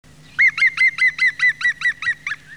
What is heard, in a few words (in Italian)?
picchioverde